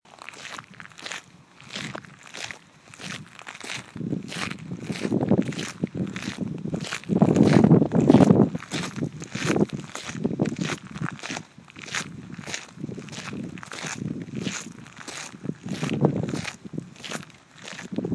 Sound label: gravel path windy heath